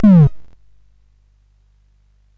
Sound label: sid CSG8580R5; Gremlins 2-The New Batch